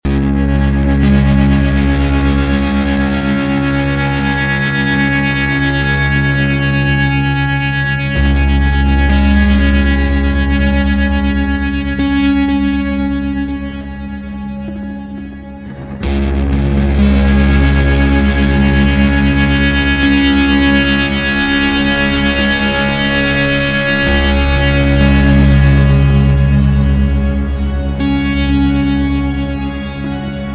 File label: Electrical Cello Blues Drone; Helsingborg